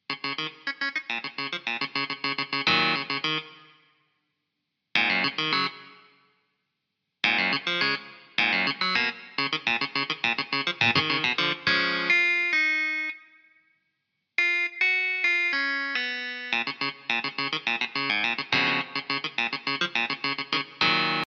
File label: clavinette